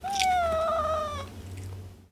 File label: animals cats domestic cat whine pleading lick; نالهی گربه زخمی